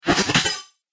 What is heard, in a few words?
Broken Droid Bits, Robot; Robot Sound Effects